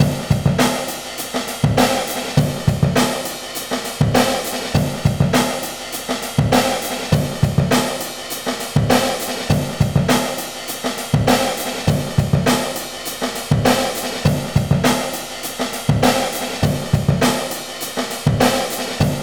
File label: 101 bpm; 90 s Sample CDs; Planet of the Breaks